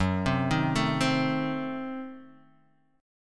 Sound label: C Diminished 7th F sharp, chord